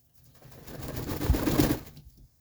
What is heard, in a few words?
Duck Flapping Wings